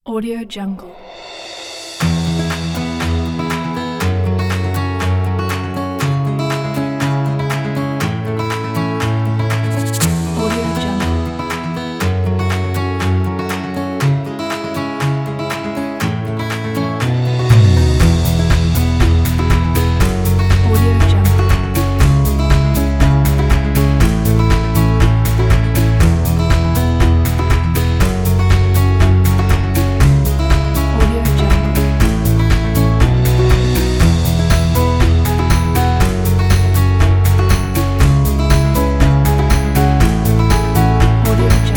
guitar folk

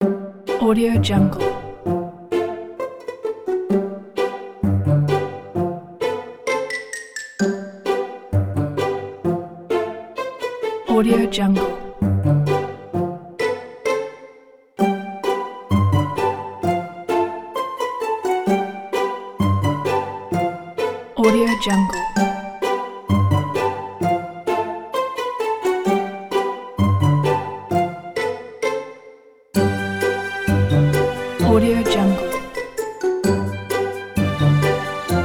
quirky

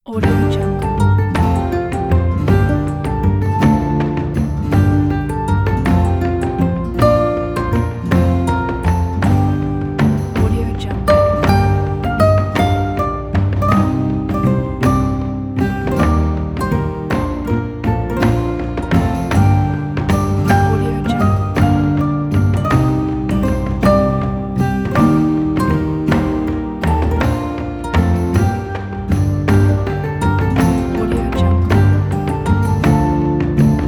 medieval campfire

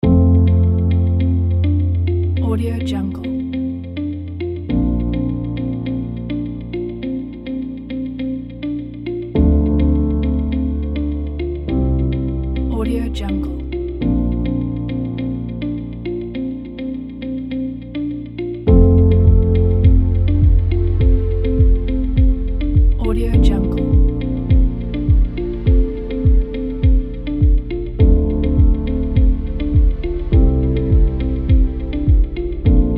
ambient music kit

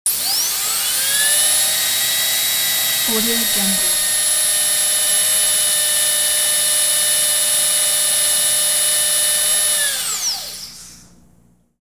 dentists drill; prosoundfx

